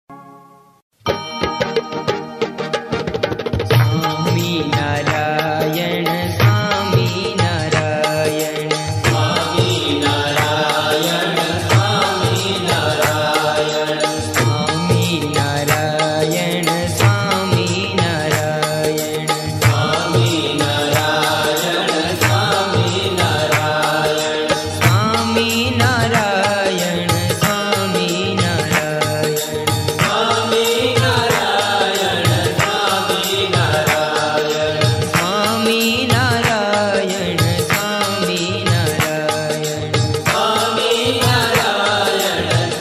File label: Swaminarayan Dhun Slow